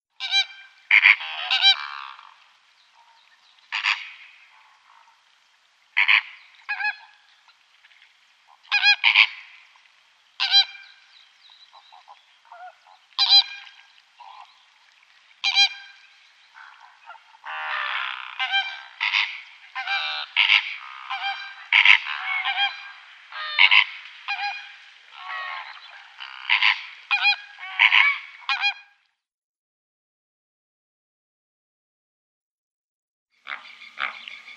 Greater Flamingo

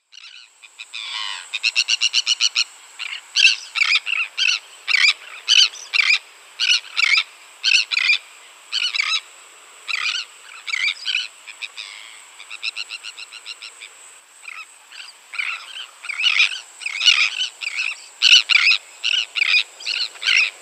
Royal Tern calls migration

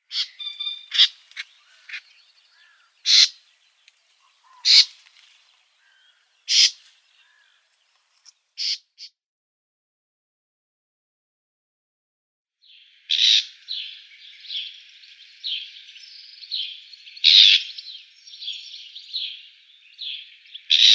Reed Warbler calls

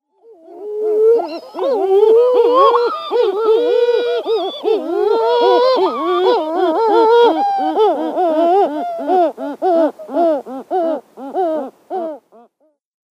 Great Shearwater

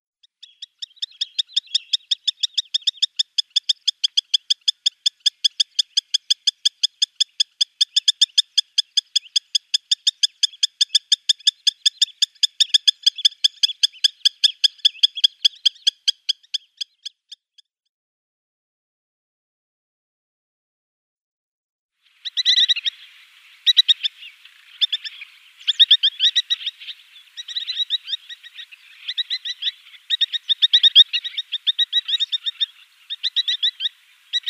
Spur-winged Plover calls

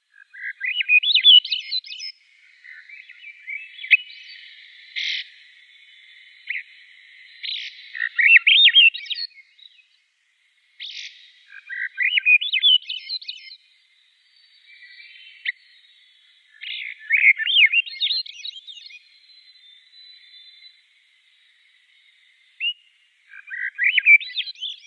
Veery song

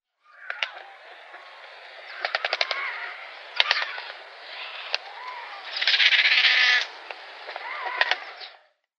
Yellow-billed Stork bill Clattering

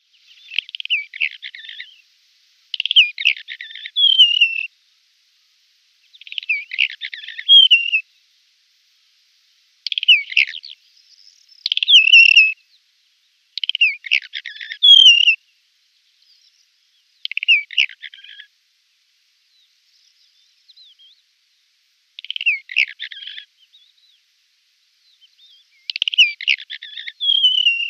Red-rumped Wheatear song

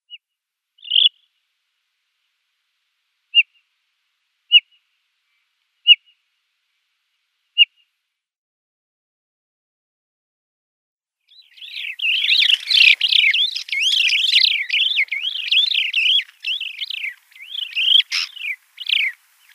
Desert Lark calls

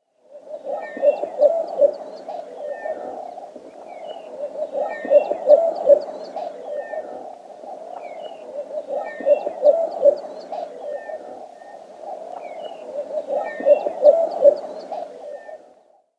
Dwarf Bittern

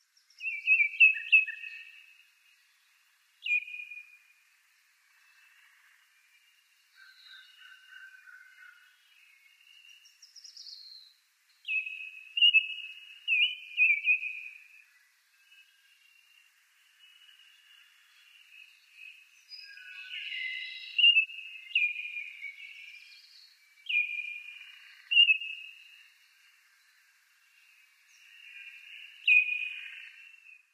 Baltimore Oriole song

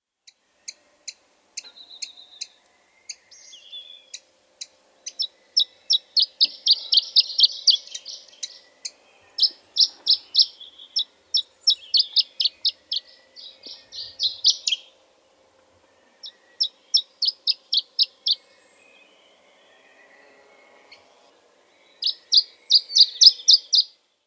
Canary Islands Chiffchaff song

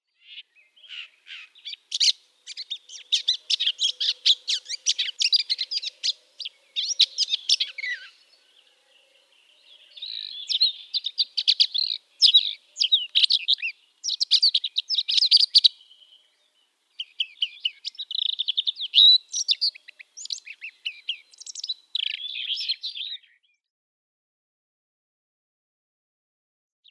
Red-backed Shrike song

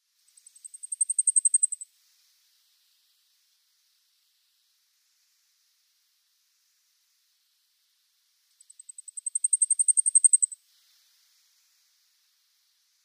Blackpoll Warbler song

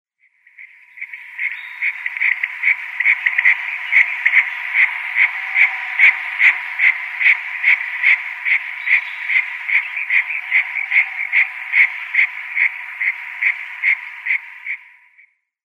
Red-necked Nightjar female display

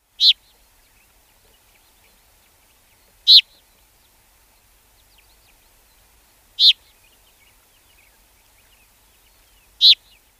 Black-crowned Sparrow-Lark calls

